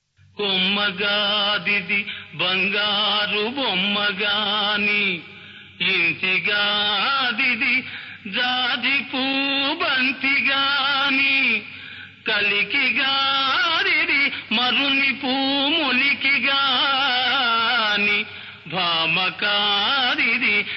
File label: Padyam